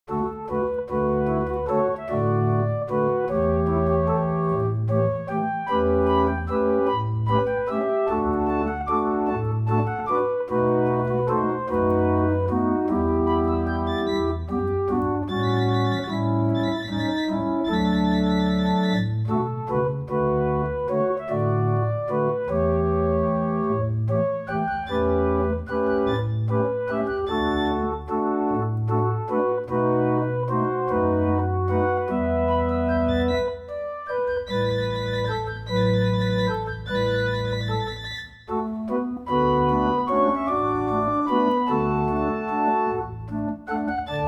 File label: Waltz, Barrel Organ; Berger